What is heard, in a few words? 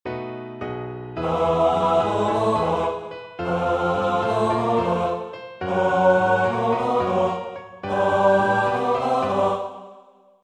Hallelujah Chorus